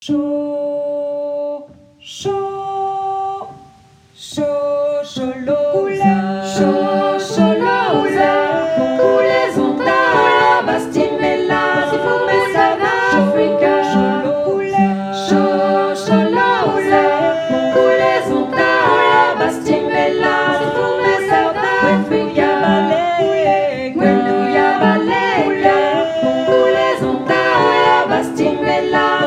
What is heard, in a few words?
shosholoza tutti